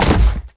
tile hard land; Original TK Sounds

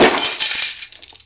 obj break; Original TK Sounds

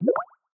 Video Msg Record; Skype Sounds

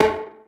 pipe step; Golden Crayon Sounds

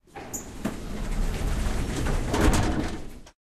elevator open; Golden Crayon Sounds

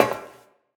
metal step; Golden Crayon Sounds

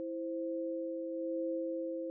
tonal loop; iOS Sounds

